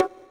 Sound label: Bongo, Yamaha PTX8